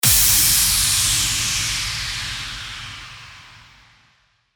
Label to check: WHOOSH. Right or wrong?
Right